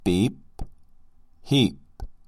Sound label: beep